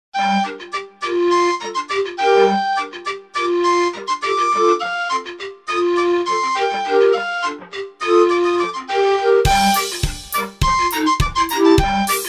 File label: pipes; russian